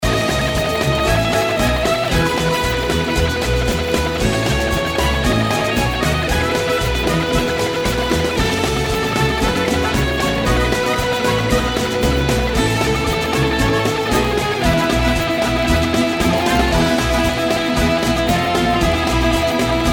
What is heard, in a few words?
you won track; Lucha Rumble